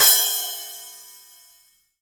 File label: CYM, Real Drum Kit; Best Service ProSamples vol.43; JUNGLE KIT